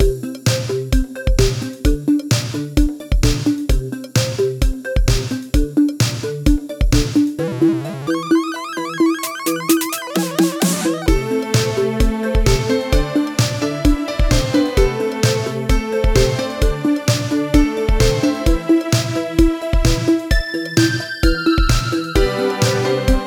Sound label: BGM